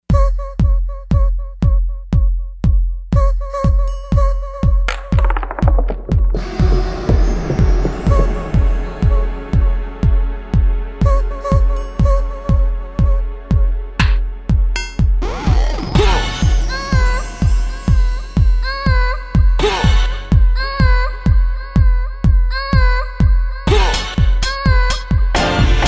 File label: Euro Kit; Roland SRX-05 Supreme Dance